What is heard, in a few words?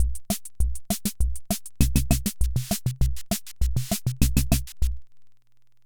Hammond Auto-Vari 64 Loop, drum machine